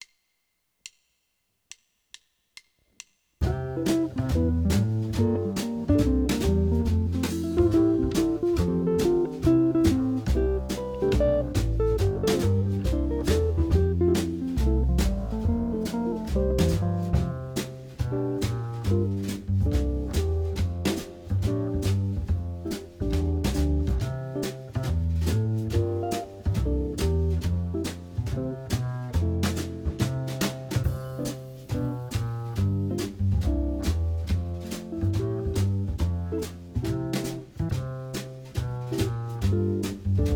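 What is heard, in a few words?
Cmaj7 Fmaj 7 Backing Track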